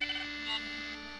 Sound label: airliner, zaps and beeps